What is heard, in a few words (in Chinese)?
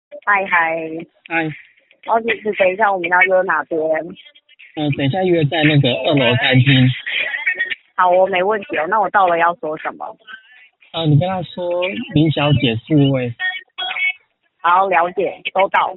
餐廳開降噪